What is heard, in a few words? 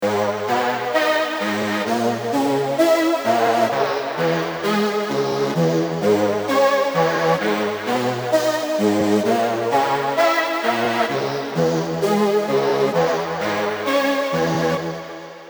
Emc2 Melody